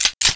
bolt slide, weapons

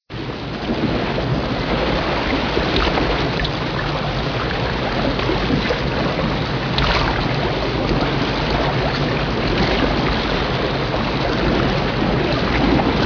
rolling sea loop

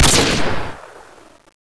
intervention fire; weapons

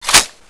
AK47 Clip In; weapons; AK47-Beast

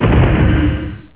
Possession Missile Hit; Warcraft 3

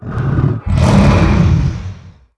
Black Dragon Attack; Warcraft 3

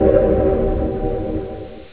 flame strike target wave non loop; Warcraft 3

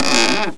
wood strain

